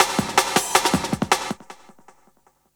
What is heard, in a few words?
Promenade Break, breaks